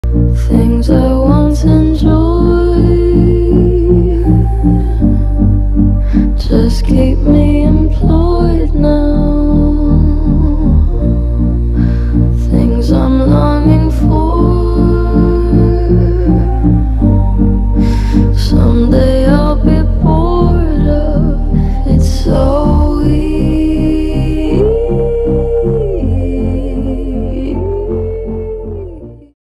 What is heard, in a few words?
english ringtone